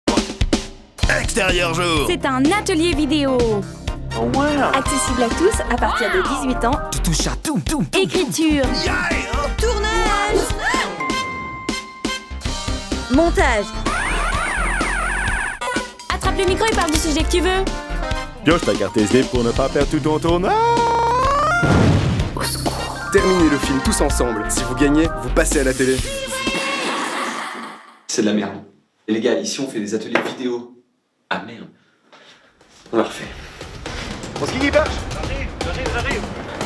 PUB EXTERIEUR JOUR

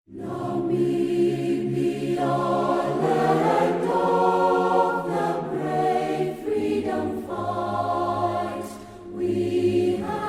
Hymne national de la Namibie

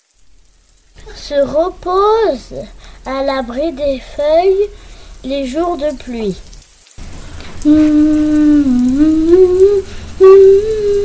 montage page jour de pluie

